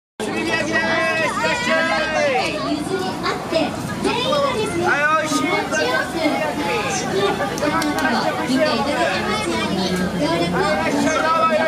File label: Beppu Festival; Sounds of Beppu